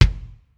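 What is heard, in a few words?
room kick; sr16 bd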